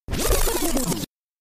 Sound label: backspin